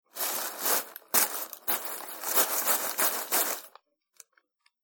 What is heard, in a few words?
Packaging Paper Noises; Foley; Andrew Fleming